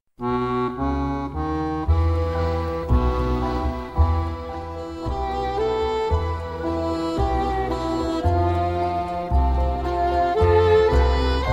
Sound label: instrumental (orchestra-only version)